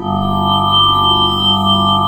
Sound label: G4 turbine; NorthStar - The Wizard VOL-2; HEAVY WATER